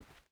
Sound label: footstep boots cloth walk; Rust